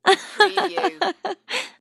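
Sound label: Woman Chuckle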